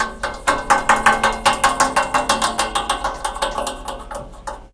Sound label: Wooden Pig Scratching Metal Heaterpipes